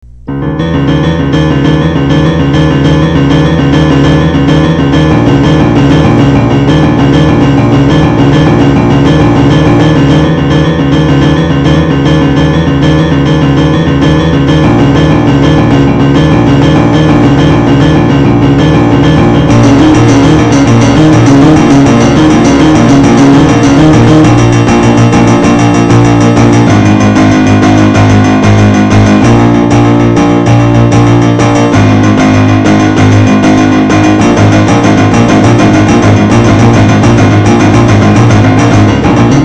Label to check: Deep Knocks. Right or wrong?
Wrong